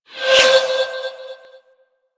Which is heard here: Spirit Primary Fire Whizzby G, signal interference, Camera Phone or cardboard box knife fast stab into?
Spirit Primary Fire Whizzby G